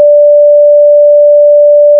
pure D5

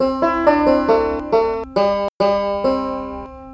2 measures synth

